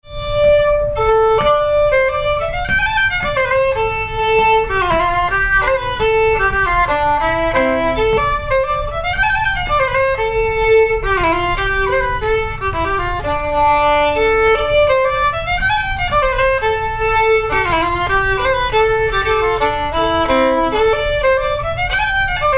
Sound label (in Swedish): Hambraeus polskan